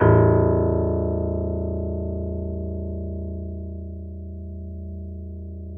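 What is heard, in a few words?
A0, grand piano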